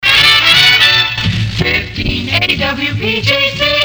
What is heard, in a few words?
jingles highland happy sounds close; pams series 9